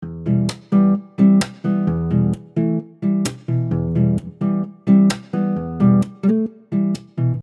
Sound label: Percussive Emin 130bpm, Soft Nylon Guitar; Percussive Patterns; MG Soft Nylon Guitar - MIDI Pack